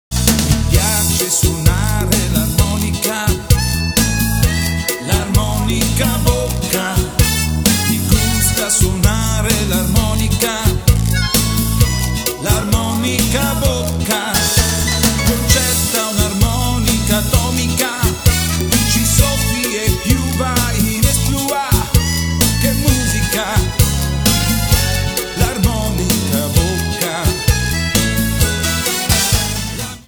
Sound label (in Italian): armonica